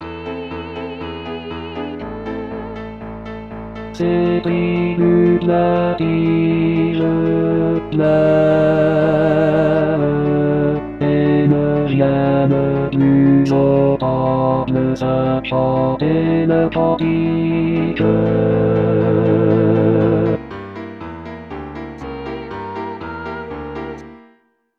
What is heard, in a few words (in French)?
cantilene basse; en français; Gallia Lamentations (Jérusalem )